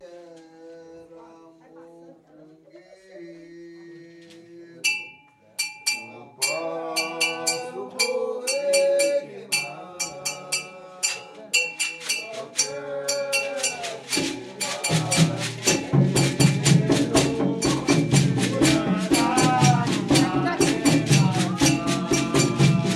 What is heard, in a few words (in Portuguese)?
tambor de mina; thoya jarina